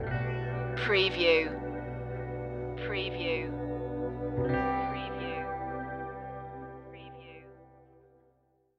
ambient flow stinger

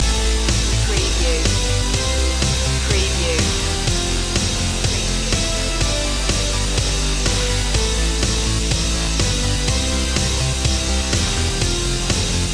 upbeat in the 80s long looping

